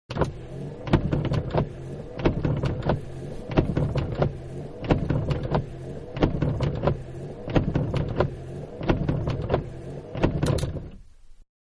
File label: Car Foreign-Windshield Wiper-Fast, Interior; Vehicles; Cars; Manhattan Production Music